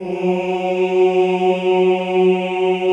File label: CHO F#2, Ooh